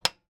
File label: outer cogwheel single hammer impacts, Metal Gears Cast Iron; NTG2; Sound Design Tiedemann